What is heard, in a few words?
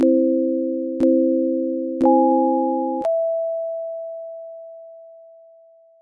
harmonic 4 cells